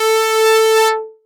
Synth Cuts; Vengeance Samples; VES1 Instruments

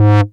Bass Multi Rev G#; Vengeance Samples

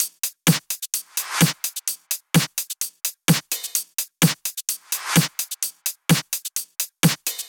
128BPM Drums; Vengeance Samples; Knight Kit

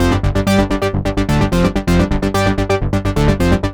Melody Kits 128BPM Root G; Vengeance Samples

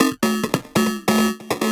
Loop 140BPM; Vengeance Samples; VTS1 Bonus Sounds

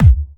Bassdrum; Vengeance Samples; VEE Bassdrums

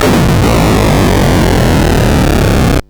Arcade Riffs

